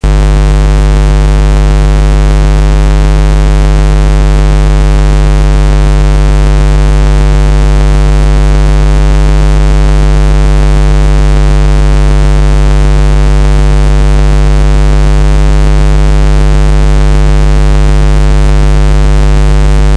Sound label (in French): Signal non pulsé démodulé 16Hz